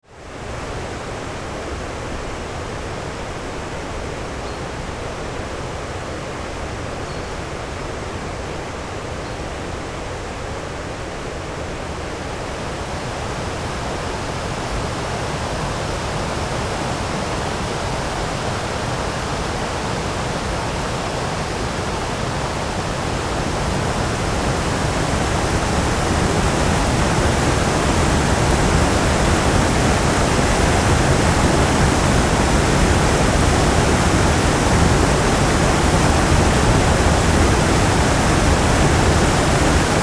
source Coly, la source du Coly; Point d’écoute : la source du Coly; la Cassagne; Lieu magique.

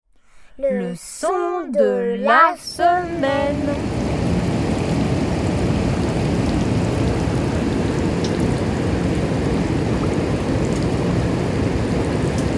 Tuilières, Une minute d’eaux rapides; Tuilières (barrage de Tuilières)

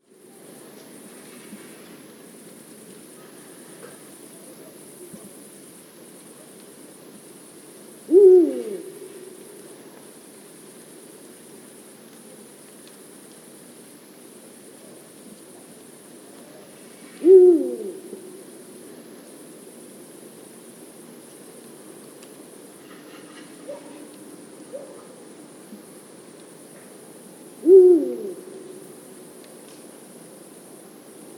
grand duc; le hibou grand-duc chante en solitaire.

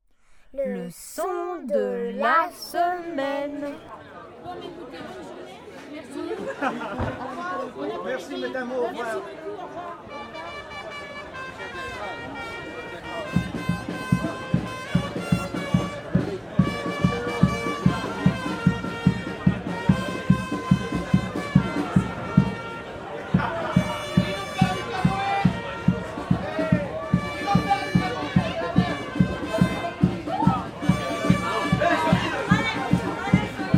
foire bestiaux, Une minute de la fin du repas de la foire aux bestiaux de Castelnaud; Castelnaud-la-Chapelle

